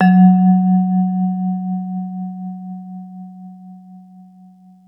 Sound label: mallets